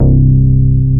MOOG MUTE C2; East West - Global Sounds

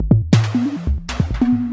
percussion loops, Techno; Best Service ProSamples vol.45 - Techno ID

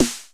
snare; Roland MC-909

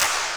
CLAPS HIP; Best Service Dance Mega Drums

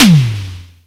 Snare, Roland JX-305

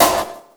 SD, Drum & Bass, 150 BPM

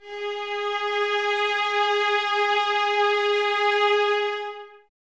violas G#4, String Ensembles, Exprsive; Miroslav Vitous

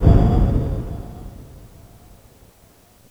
A#4 Wind Short, SFX Kit

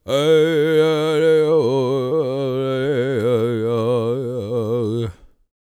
World Voices, CHOCTAW

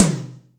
AC Tom M, Acoustic Tom; Yamaha DTXpress

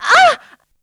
SEX; Zero G; Man Machine

